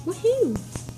Woohoo

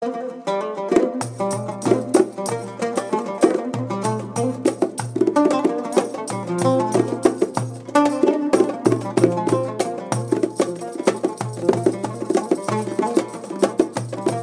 Arab ringtone

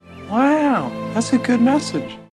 Good message

